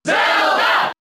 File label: Cheer; Zelda; Brawl